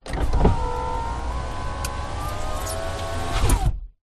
car suv holden captiva electric windows both front up; transport